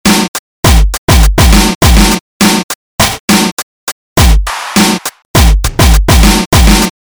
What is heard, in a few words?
Loop